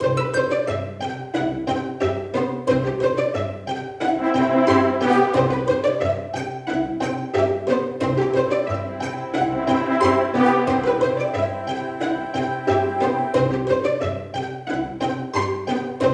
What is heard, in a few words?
cheerful entertainment pizz; by burak sound bank; cheerful vol 5